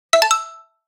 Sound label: Xylo Out, notifications; Yota Yotaphone-2